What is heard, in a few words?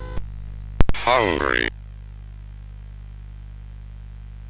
sid CSG8580R5; Cave of the Word Wizard Set 4